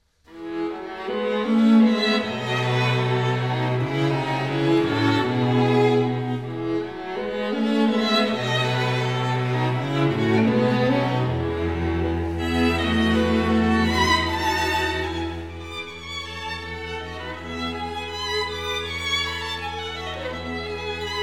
Quartet in E, String Quartet; Haydn; Op. 54

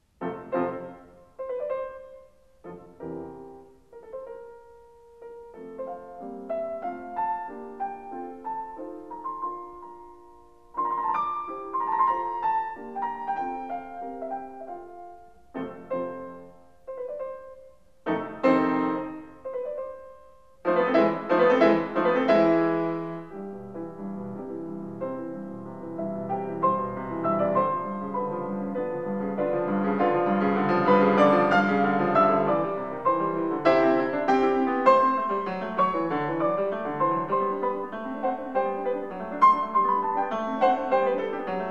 Piano Sonata No. 6 in F major; Wilhelm Kempff; Beethoven; Piano Sonatas

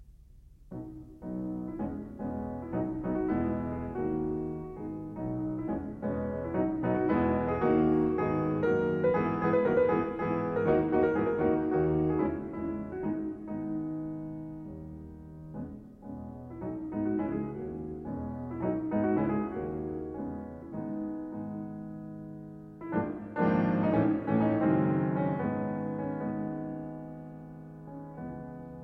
Prelude in B major, piano; 24 Preludes

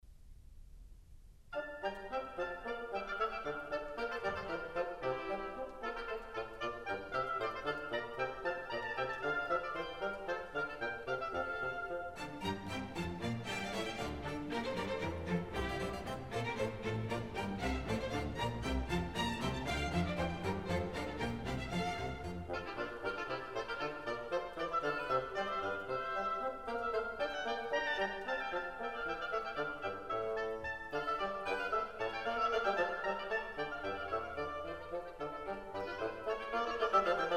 Music for the Royal Fireworks

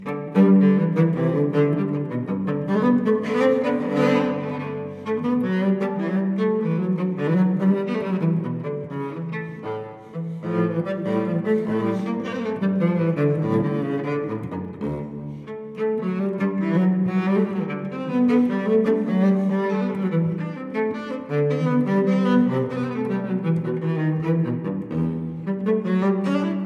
Cello Suite No. 1 in G major Gigue; BWV1007; Johann Sebastian Bach

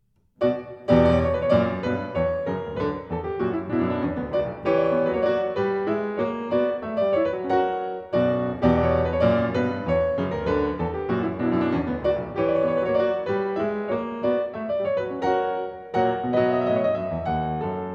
French Overture In B Minor

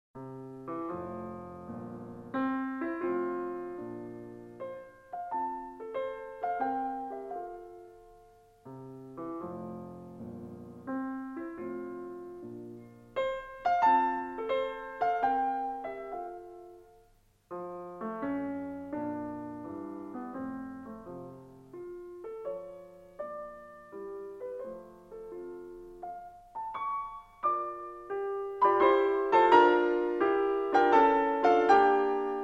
Piano Sonata No. 22 in F major; Wilhelm Kempff; Beethoven; Piano Sonatas

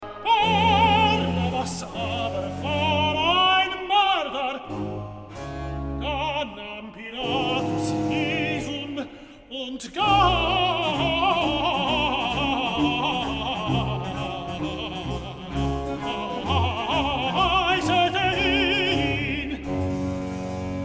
Recitative; Bach, J S; Johannes-Passion, BWV 245, Pt. 2; X. Recitative. Barrabas aber war ein Morder

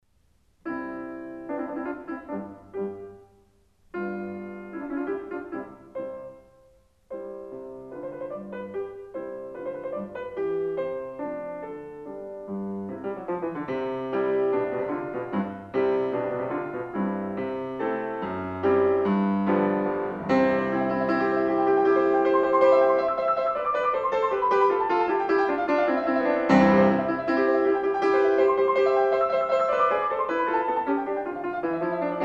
Piano Sonata No. 3 in C major; Wilhelm Kempff; Beethoven; Piano Sonatas

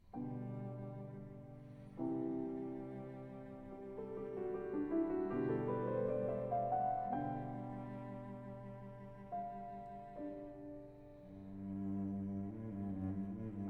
Sonata for Cello and Piano No.4 in C, Adagio

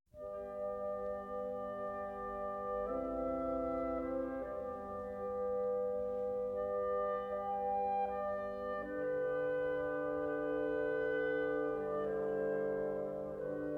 Cello Concerto in B Minor; Jacqueline Du Pré; Haydn

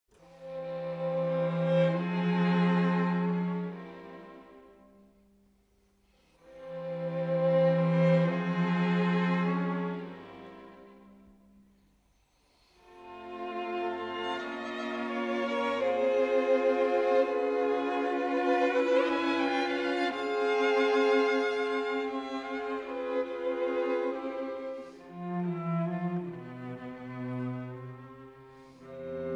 String Quartet in F Minor, Larghetto; String Quartet No. 11; Op. 95; Quartetto serioso; Ludwig van Beethoven; Beethoven - String Quartets Nos. 1-16

